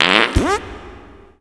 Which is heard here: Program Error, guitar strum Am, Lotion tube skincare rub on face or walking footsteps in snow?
Program Error